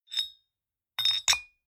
Bar Whiskey Glass Liquor Decanter Open And Solid Closed Glass Rattle; Drink Mixing